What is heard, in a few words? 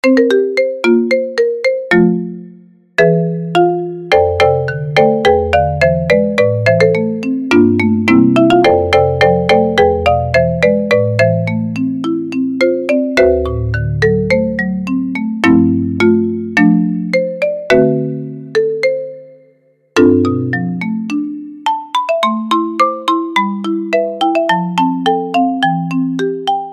Marimba; Spirited Away